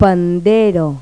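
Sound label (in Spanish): pandereta; Pipo-Aprende musica con Pipo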